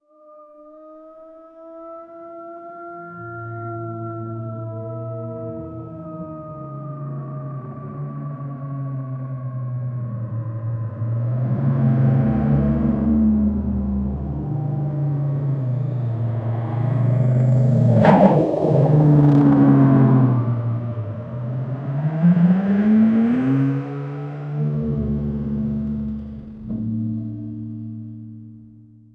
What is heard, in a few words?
car transformation; shifting